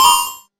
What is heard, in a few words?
tower sell; Tower Defense; freepixel